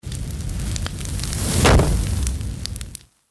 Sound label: outro chilli sfx; without music